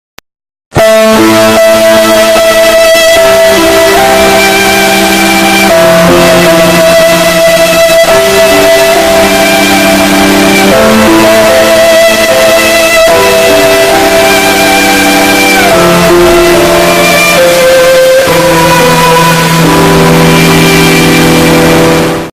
Hyper Distorted Sad Violin; MLG Sound Effects

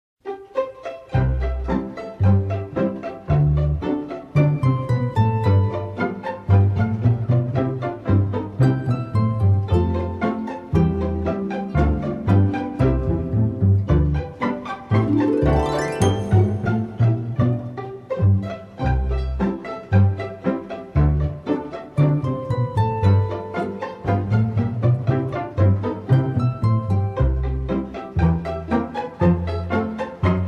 Pizzicato Playtime, Comedy Background Music

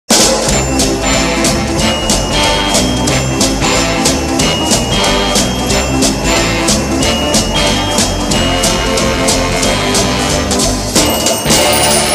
Sleigh Bells Ringing Trap Remix; TGFbro

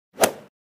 Golf Ball Hit; Gaming Sound Effect

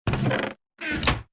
Chest (Open and Close); Minecraft

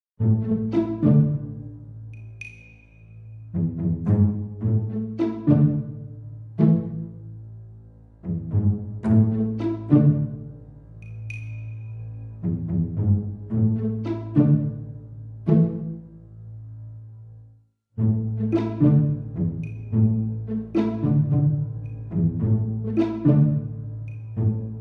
Sneaky Adventure, Gaming Background Music

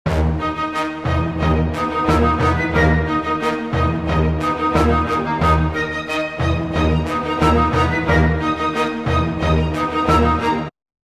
Dramatic Music

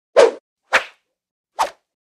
Whoosh Transition Whip Swish Pan Comical; Comical Sound Effects

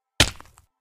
Fall Damage (Crack); Minecraft

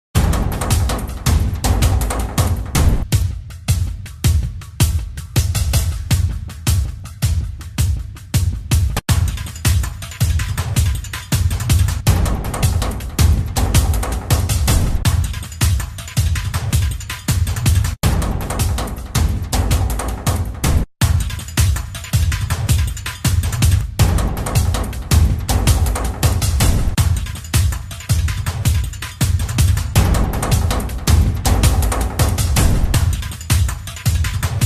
action music

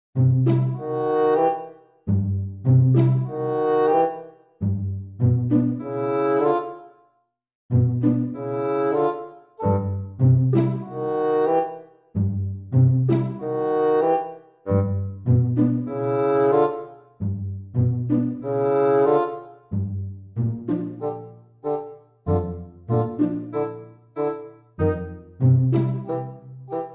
Gaming Background Music

